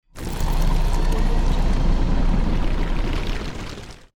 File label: drilling shaft mining game sound